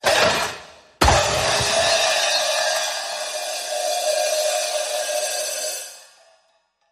Extended Cymbal Drop

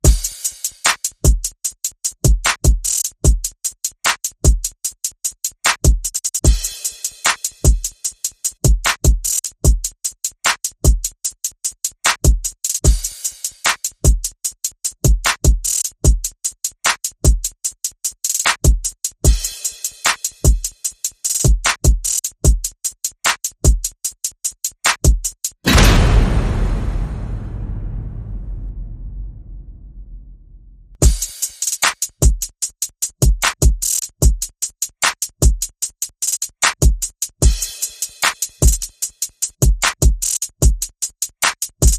Perc and FX

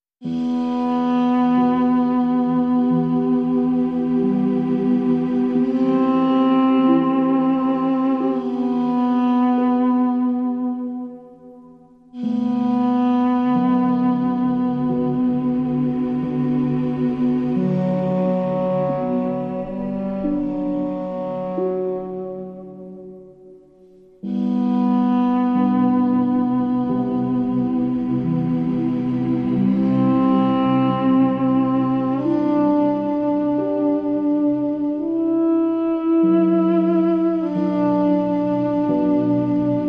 No Strings

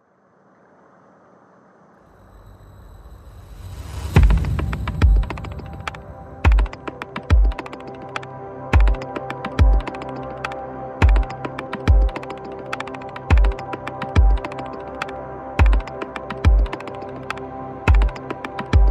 Perc Pads and FX

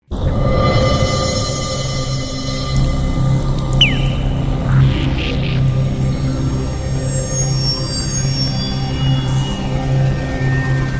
MultiDrone

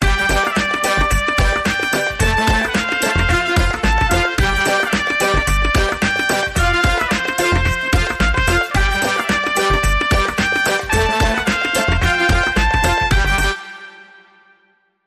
Mariachi Funk

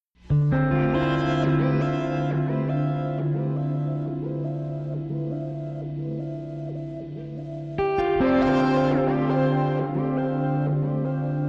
AMB Guitar

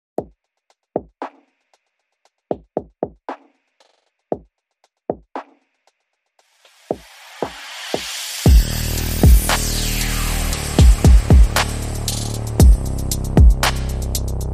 Drums Bass and SFX Only

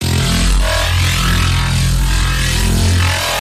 glitch

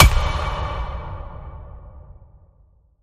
Home Run Smack

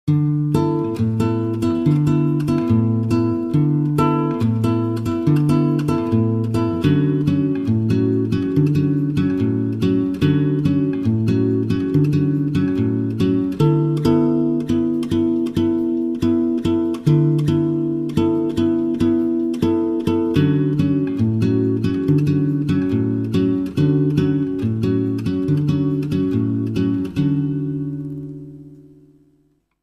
Bossa Nova

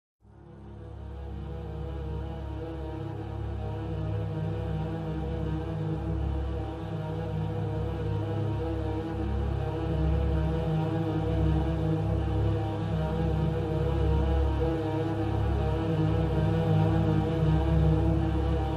Only Strings

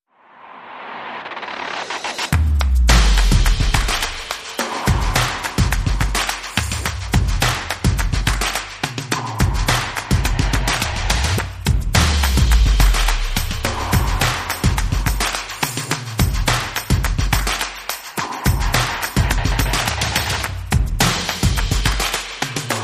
No Agogo

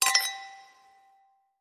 Toasting Wine Glasses

